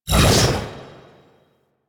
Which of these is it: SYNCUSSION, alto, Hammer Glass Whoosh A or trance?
Hammer Glass Whoosh A